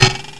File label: bow hit; wanted; weapons